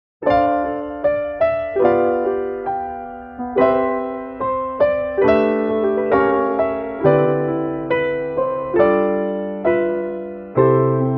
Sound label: ACCOMP C FASTER